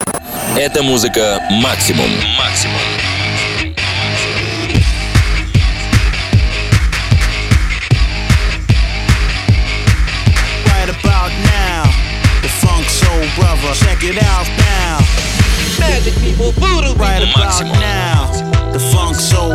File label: Jingle Radio, Beatmix; Radio Maksimum